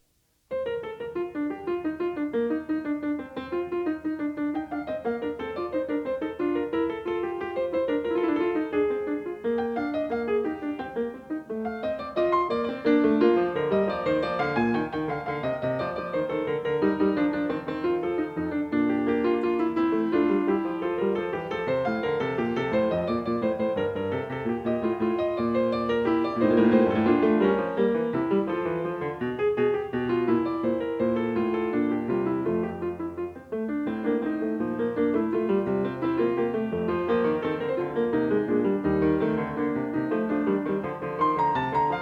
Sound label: Fugue No. 21 in B-flat major; BWV890; Bach; Glenn Gould; The Well-Tempered Clavier; WTC Vol II